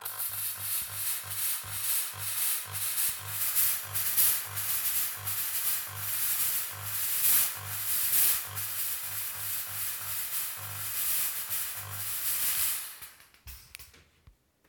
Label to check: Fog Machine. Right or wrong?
Right